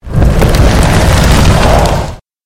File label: monster holy flame